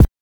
BANK1 BD, NES DPCM; MIDINES

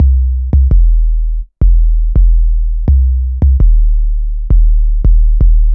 808 Standard, Bass Lines 166.5; Jungle Warfare 1&2

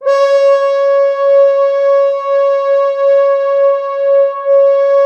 Orchestral Brass

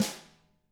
DW2 HIT, Drums; DW KIT1

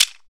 PRC WOLOF, Afro Shakers; Roland LCDP14 Africa VOL-2

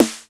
Snare, Alesis DM5

